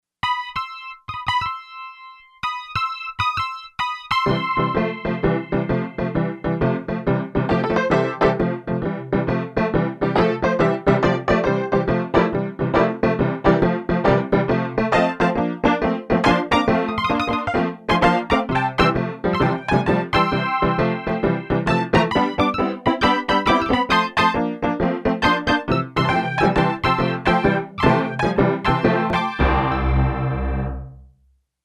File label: Honky Tonk Piano, Yamaha TX802; Performances; Factory Patches